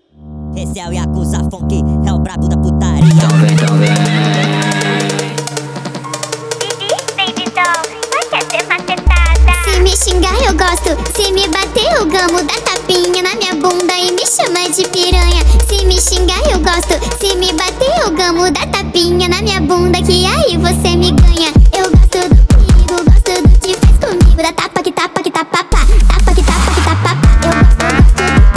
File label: phonk step up sped up